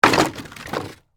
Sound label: Crash Wood Debris, Crash, Wood, Debris, Drop, On, Wood